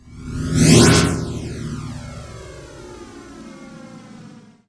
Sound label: fly by; Earth and Beyond; EA Games